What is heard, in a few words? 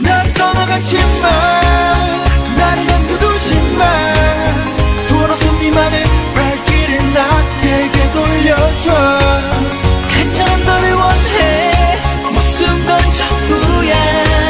Chorus; Hwarang OST